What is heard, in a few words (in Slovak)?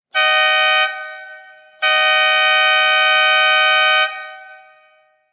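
fanfára 2 tónová 12V trúbka chróm; stebel gpa2; stebel gpa2 trumpeta chrom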